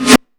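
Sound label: Break Snare reverse, Roland SH-32 Drumset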